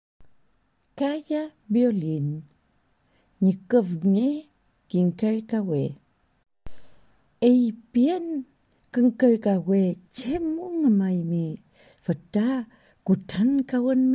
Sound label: Calla violin